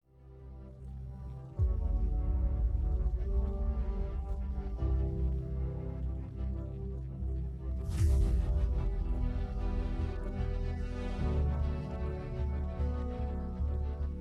khmer no vocals; khmer đỏ

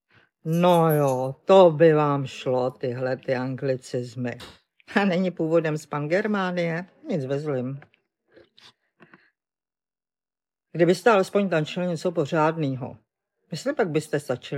vocals

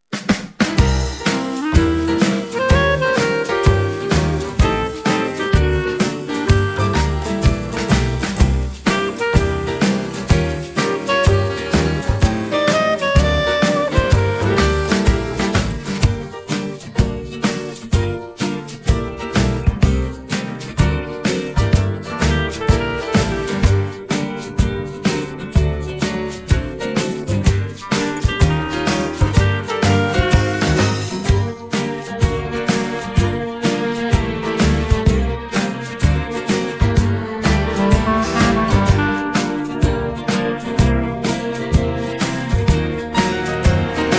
no vocals

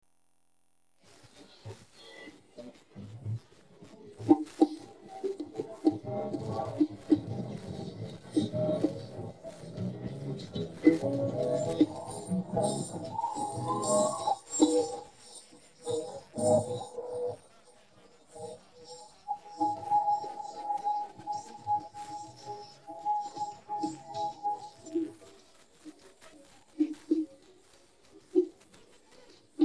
no vocals

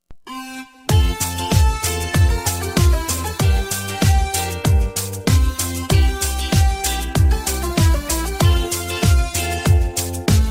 no vocals

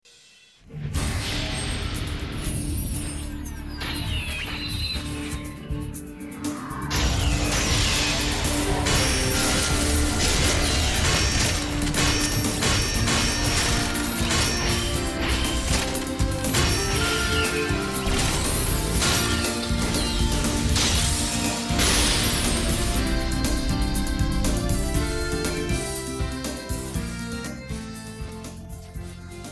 instrumental no vocals

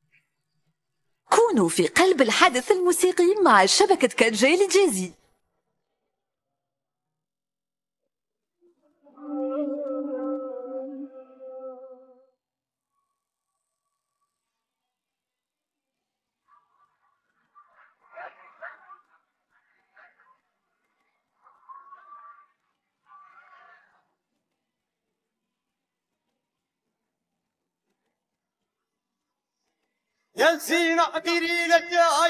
vocals; sawt live; rai

